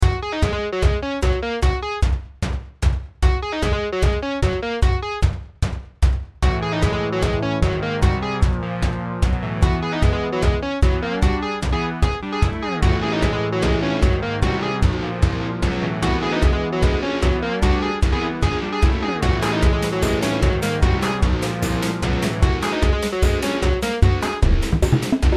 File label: 8-Bit Night